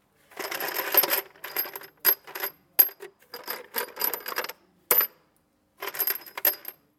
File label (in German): Schrauben